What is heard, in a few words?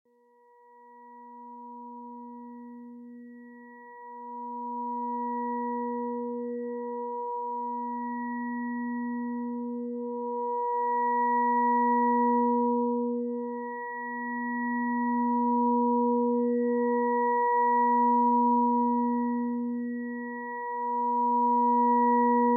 963Hz Energy Transcender